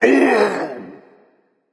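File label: non verbal ouch, voice; cernunnos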